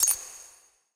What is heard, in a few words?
diamond collect